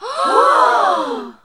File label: NOISE, CLASSIC VOX; Best Service; Hallelujah